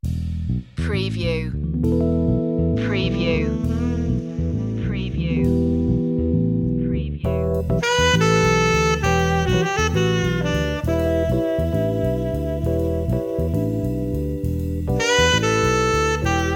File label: groove